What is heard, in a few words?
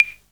CR 78 KIT